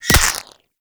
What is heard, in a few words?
Ghost Expel Spirit Impact; Warframe; PriestQuest; RellGhost